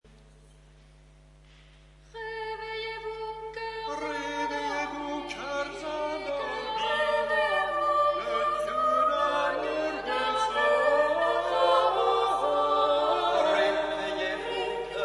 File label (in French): Le chant des oiseaux